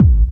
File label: BD, Dance Mega Drums, BD HOU; Best Service